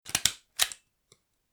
tiếng bấm kim giấy bấm ghim

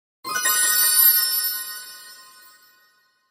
am thanh kim cuong lap lanh

